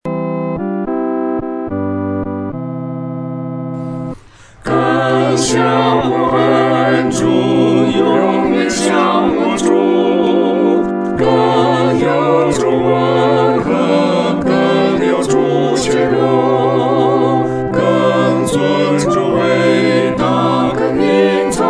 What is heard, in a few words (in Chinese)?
合唱 四声部